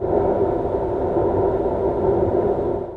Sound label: Wind; legend of wolf windows 1997